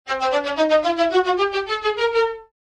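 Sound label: violin sounds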